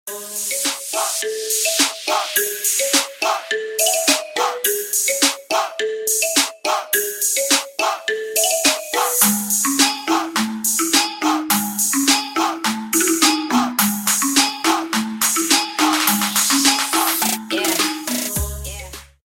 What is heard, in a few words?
Jungle